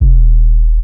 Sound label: Clean 808